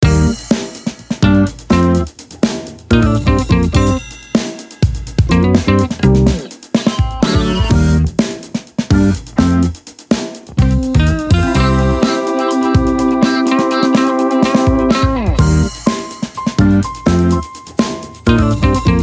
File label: Music Results Loop; Stikbold! Dodgeball Adventure Deluxe